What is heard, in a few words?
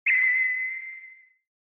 Retro Anime Accent Low Short